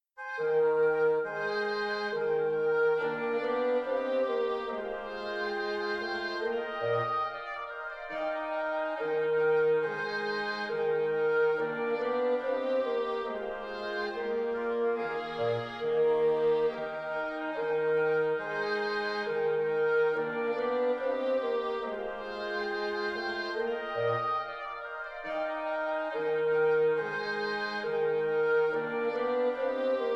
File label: woodwinds; spitfire; Spitfire Audio; Spitfire Symphonic Woodwinds